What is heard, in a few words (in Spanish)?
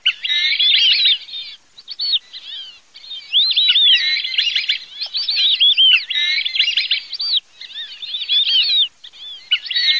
SIRIRI MIX